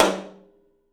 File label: TOM, 3D Drums; 3D Sound